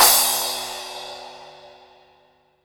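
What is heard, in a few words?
crash, Drums Acoustic; USB Soundscan vol.10; AKAI; 90s sample CD; DYNAKIT 2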